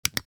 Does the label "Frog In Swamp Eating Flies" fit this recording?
No